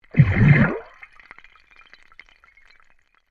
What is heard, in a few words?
UNDERWATER BUBBLES BURST CLOSE, Air released from compressed air cylinder; Nature